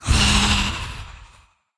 ghost blader damage, monster

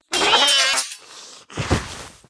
felidae dead, monster

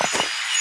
sled move

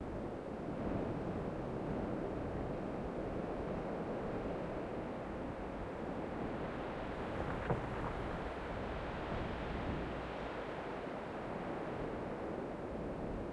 desert; forgottenruin

